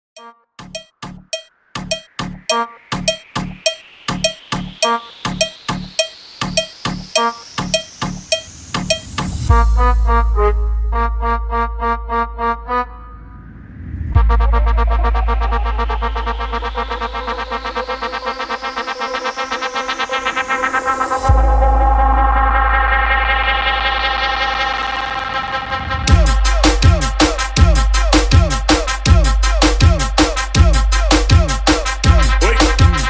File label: MUSIC SOLO TWERK